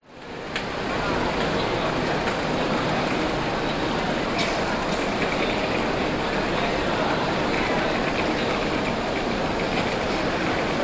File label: airport denpasar bali arrivals hall busy